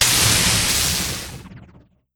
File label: flight turn; p00gie; tardis